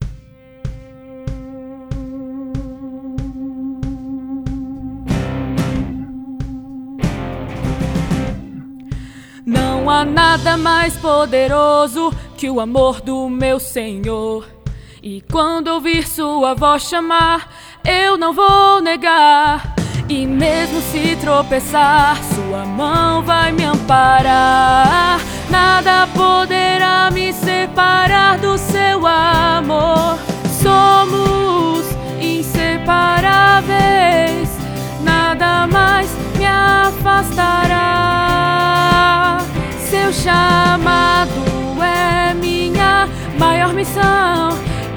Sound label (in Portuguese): contralto